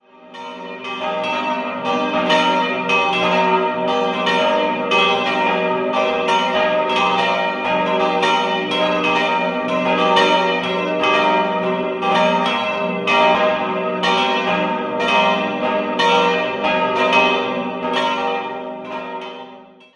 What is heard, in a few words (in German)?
Pullach, Glocken